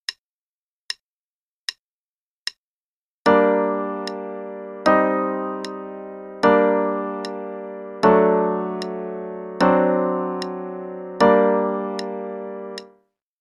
g minor Chord Progression only, qn=76; CM Level 4

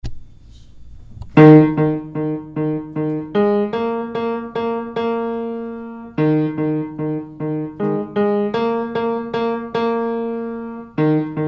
Bass

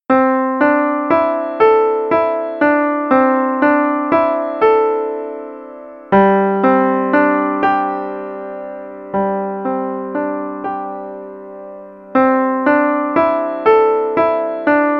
Painting With Pastels (no click, qn=120); Level 1 Performance - page 11

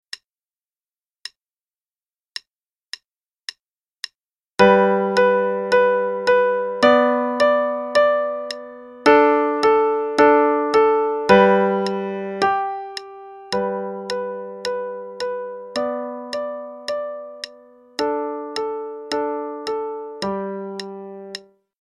Play-a-long Carefree, qn=108, piano

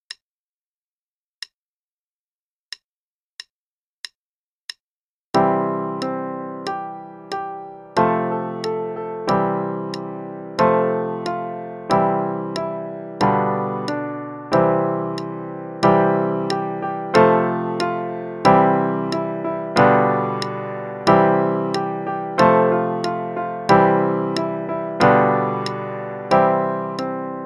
Baa Baa Black Sheep, inverted LH, qn=92

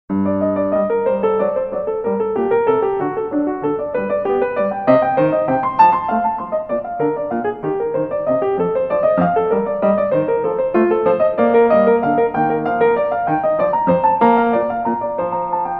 Toccata In G Minor; Marlais Book 6

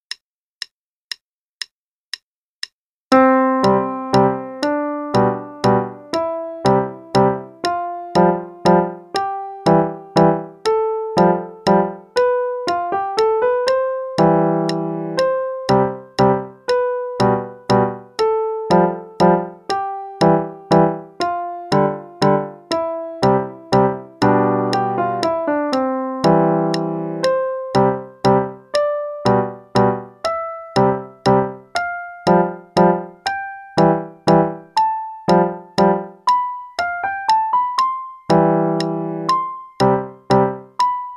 Scale Waltz (qn=120); Adult Level 1 - page 126

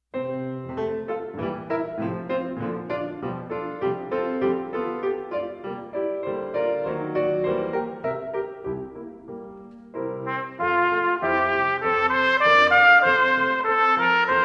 Seranade, Trumpet; Canadian Brass Intermediate Solos

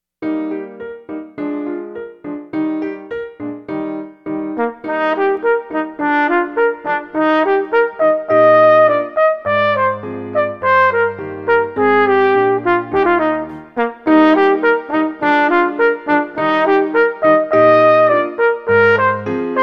Rondeau With Acc., Trumpet; SOE Festival Solos Book 2

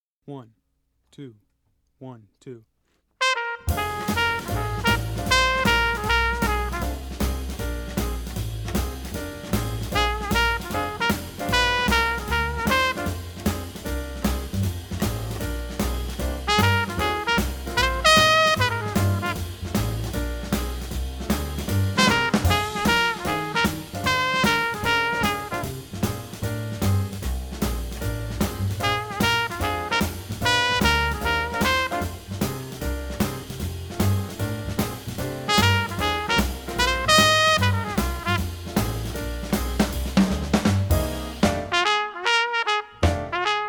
Shufflin' In F, Trumpet; jazz; easy jazz conception

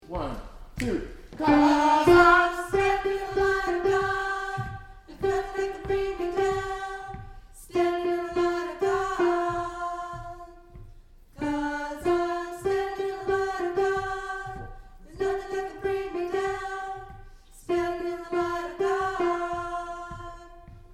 Alto part

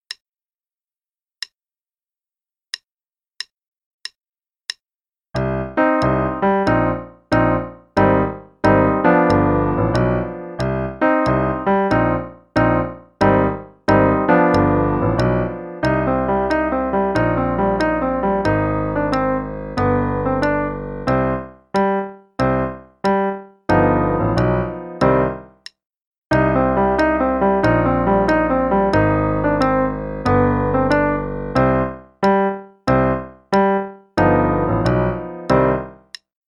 Jazz Blast Improvisation (qn=92); Level 2A; Faber Improv

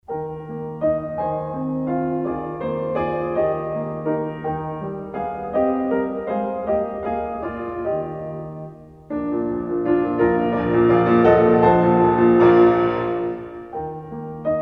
Allemande in E flat; Marlais Book 4

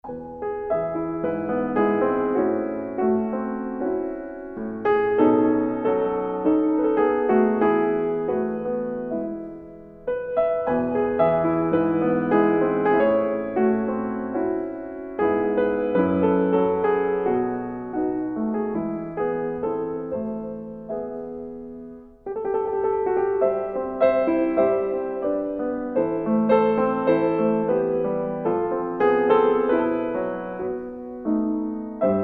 Improvisation; Marlais Book 5

